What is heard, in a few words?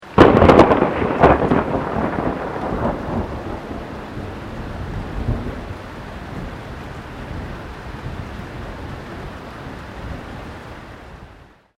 THUNDER CLOSE RAIN; Elemental weather effects